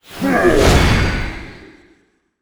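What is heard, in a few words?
soulseeker spawn; monster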